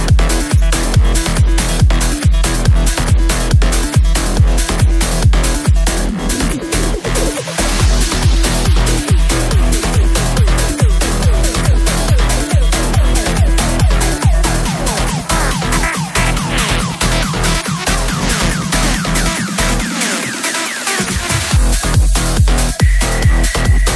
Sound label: Vivo Latest Ringtone